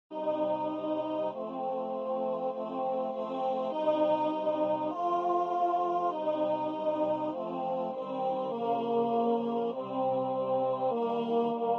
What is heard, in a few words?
tenor; sala ensayo